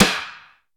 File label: roomy snare single hit g key; snare drum samples